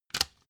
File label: cd cover open tonal